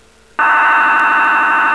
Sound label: siren